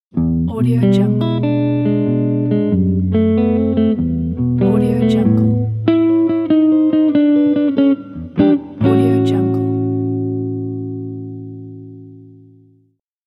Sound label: blues guitar logo; evolvingvibes